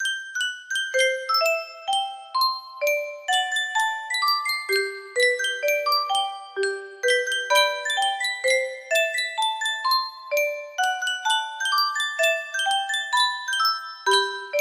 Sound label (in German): yunsheng spieluhr